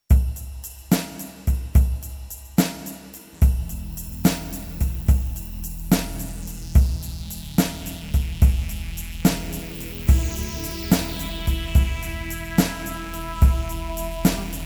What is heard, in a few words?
Sum Soul Instrumental